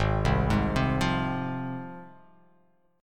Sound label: G sharp 6th G sharp down, chord